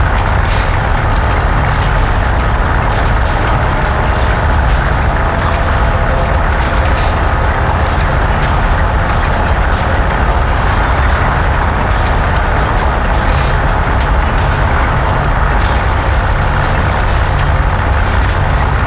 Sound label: Gear Loop